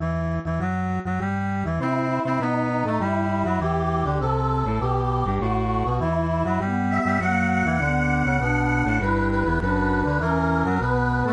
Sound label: MIDI; BWV4